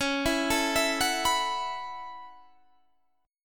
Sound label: A 6th Add 9th C sharp, guitar